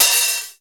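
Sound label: hi hat open, RY30 drums; East West; Global Sounds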